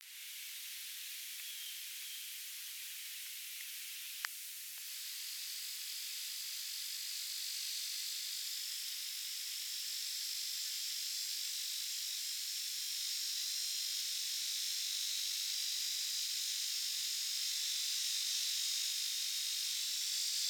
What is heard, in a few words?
Neotibicen lyricen